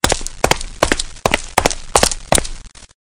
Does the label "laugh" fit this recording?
No